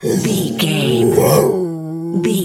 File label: bear roar